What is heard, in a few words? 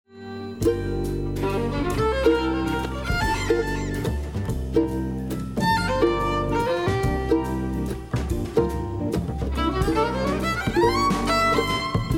Lap-Nils Solo